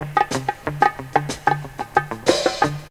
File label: Percussion; AMG - Coldcut's Kleptomania VOL-1